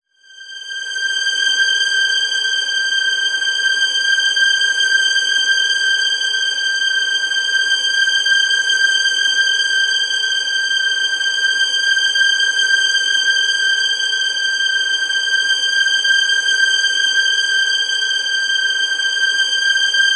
high string tone

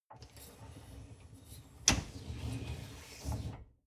dishwasher upper tray draw in long

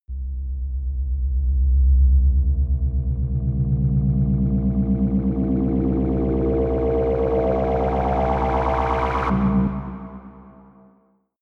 rise hum whir deep

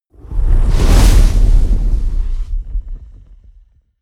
whoosh fire ignite large

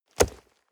nylon leather hit hard dry

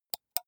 computer mouse left click single

